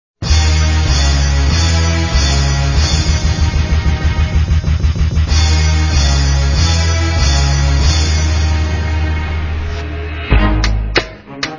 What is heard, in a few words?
Instrumental